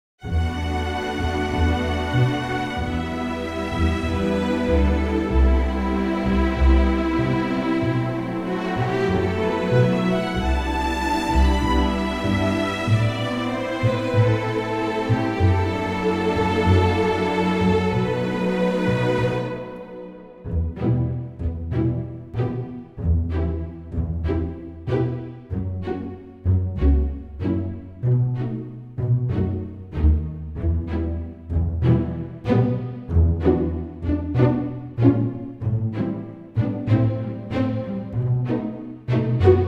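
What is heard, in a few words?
Em orchestra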